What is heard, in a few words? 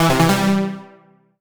MUSIC EFFECT Solo Warm Synth Positive (stereo); startide macos switch windows gamerip 2017